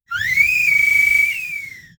isolated rotary engine slowdown

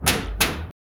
windows, computers

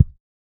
soft rounded tactile thum

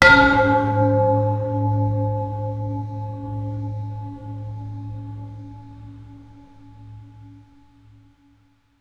a deep resonant bell strike

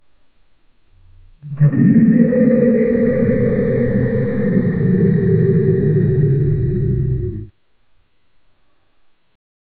psychic slowed earraping

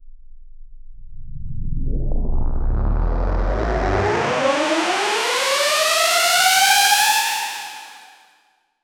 riser sound epic sfx

